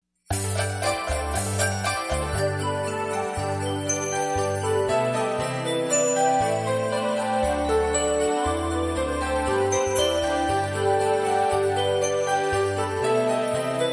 Jingle Bells

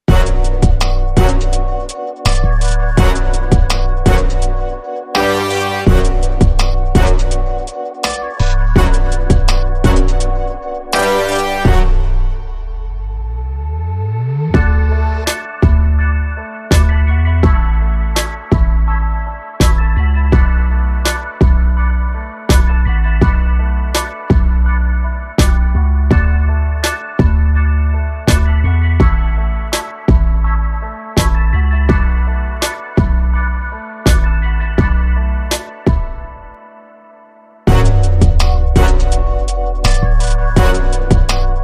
Inst

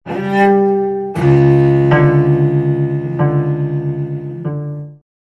Cello Danger Eerie

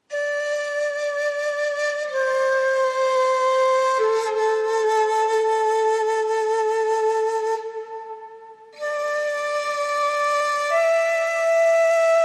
Shakuhachi Only

